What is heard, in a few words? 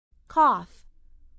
cough